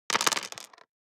DICE on Plastic, Throw and Roll, Standard, 6 Six Dice; Samples; ArticulatedSounds; DICE Sfx Party (Extended)